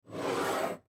Coffee Cup Slide, Coffee Cup Slide Scrape Counter Top; Dishware; Household; Blastwave